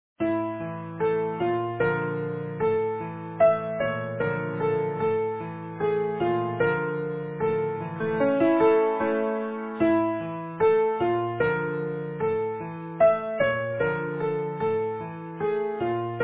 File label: easy piano